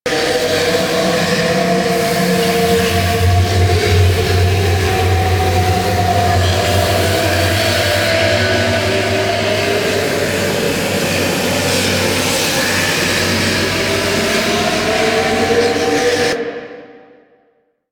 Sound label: Corrupted Aura Inner Loop; Warframe; Zariman; Survival